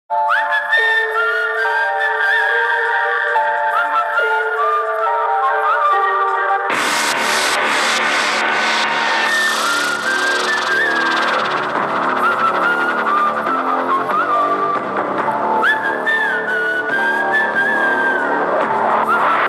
Whistle Theme; Valimai

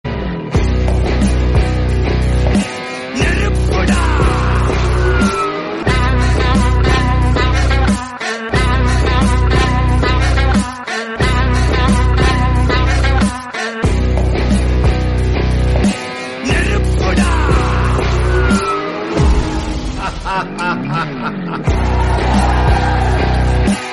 movie bgm; kabali

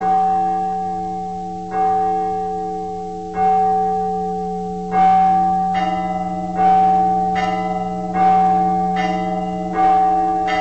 church bells

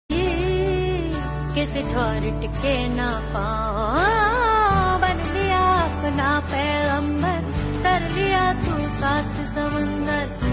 zee tv serial, ringtone

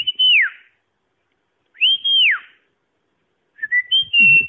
Whistle SMS

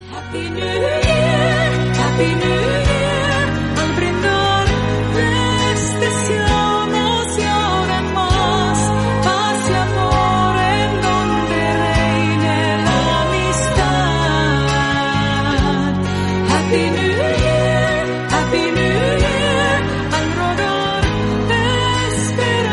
happy new year tune

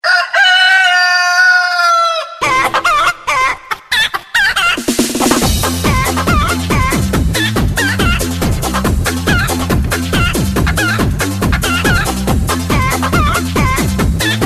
crazy chicken

